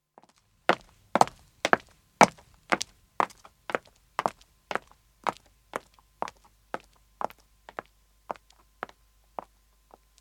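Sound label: Footstep female walk, 2 women depart; Footsteps (Sound Effects:Human); BBC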